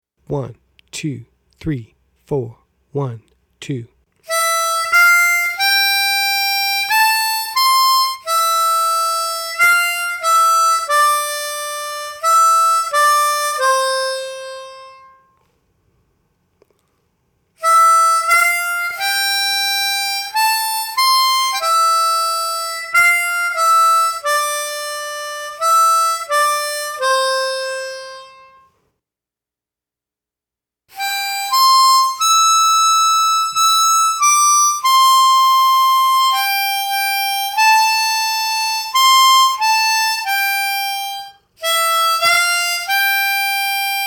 Wildwood Flower Slow

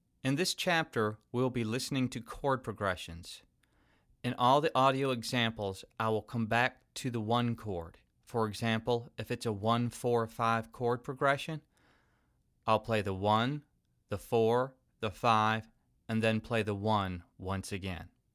Chord Progressions

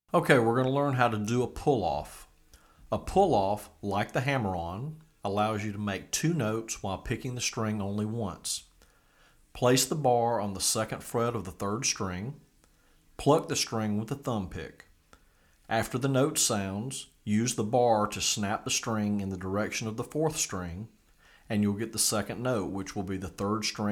Pull Off

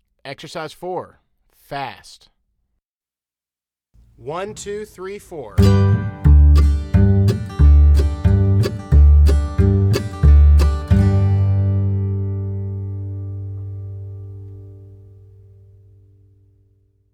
Exercise Fast

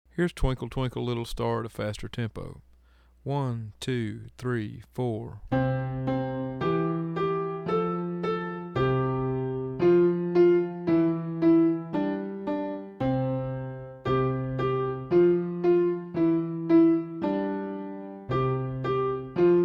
Fast; Twinkle Twinkle Little Star

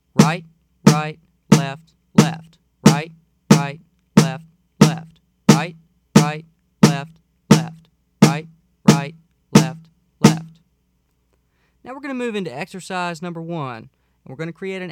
Rudiment 2

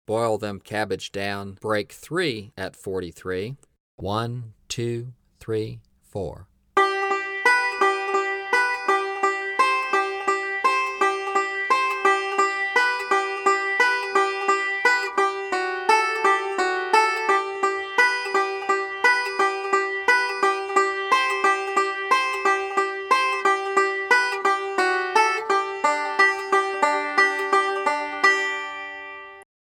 43 Bpm; Boil Them Cabbage Down Break 2

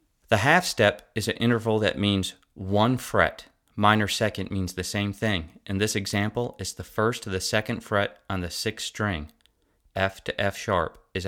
Half Step Or Minor 2nd

